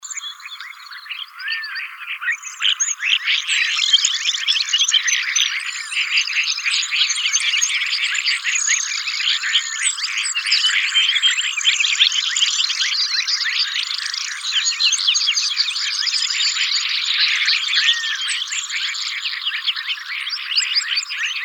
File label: Emberizids; AZFO; deviche